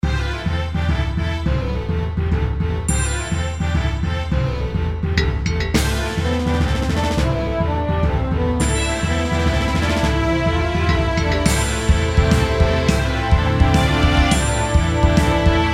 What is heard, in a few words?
Epic Clash